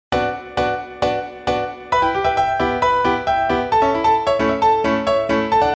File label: PIANO; multitracks